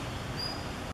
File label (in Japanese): イソヒヨドリのひなの鳴き声ヒン